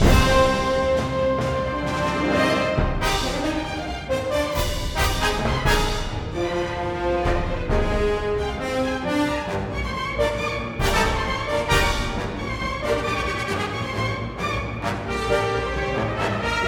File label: Battle Over Space; Coruscant Space